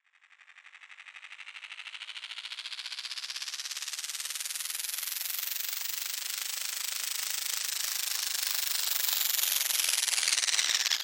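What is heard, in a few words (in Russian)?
вжух усиливающийся длинный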